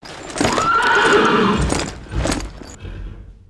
horse whinny; creatures organic

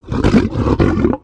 Pets Lion Happy; creatures; Lego

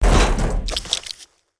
hedgehog smash; destructibles

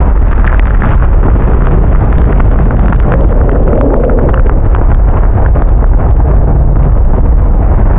bacteria, ambience

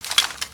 idle rattle

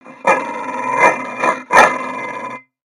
Pet Dog Robotic Angry; creatures; pets; Lego

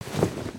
cloth

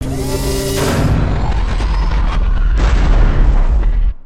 rocket launch sequence; cinematics

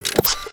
Broombot Idle, creatures mechanical; Inventor Broombot

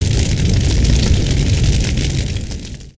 rocket ship fire loop

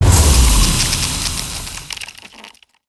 Ice Tornado End Blast; Spinjitsu

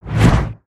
Swing; Mole Whacker

